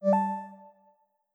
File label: Calibration Point Captured